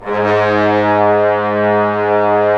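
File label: brass trombones; Roland LA Composer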